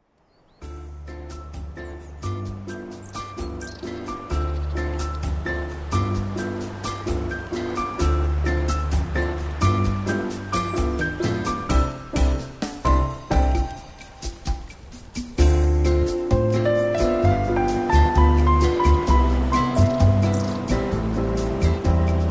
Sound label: Seaside Cafe Ambience